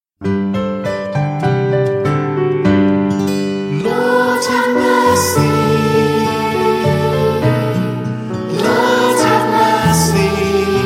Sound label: Penitential Act Vocal